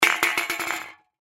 Can Knock Over Aluminum Empty; Knocks; Foley; Sound Effects:Objects; SoundEffects-Blastwave

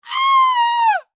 Horror Scream Male; Screaming; Voice

